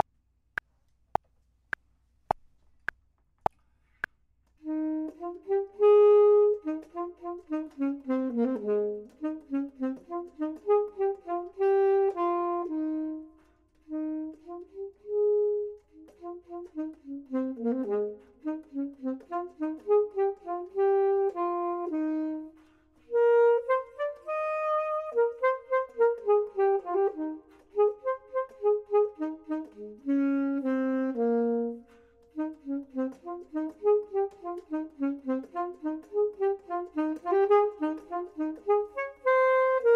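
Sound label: top, Saxophone and Clarinet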